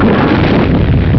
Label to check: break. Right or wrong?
Right